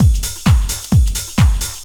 dr t 130 trance 2; Ueberschall; Techno Trance Essentials; DRUMLOOPS

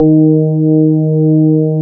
DX PIANO; East West; Twisted Textures-BT

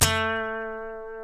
B funk, Guitars; Spectrasonics; Hans Zimmer Guitars VOL-1; TAKA FUNK

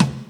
Dance Drums

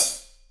HH hh; Best Service Gigapack 2

